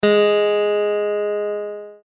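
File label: G sharp, guitar, open E tuning; Use the audio tones below to tune your guitar to an Open E Tuning; 3 g sharp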